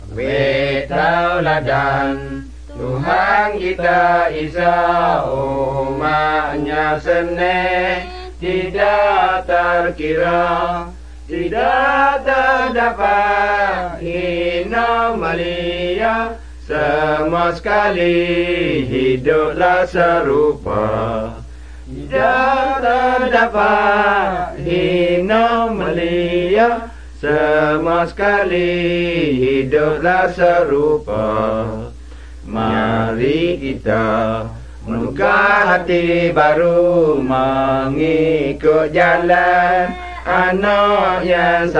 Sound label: songs malay pattani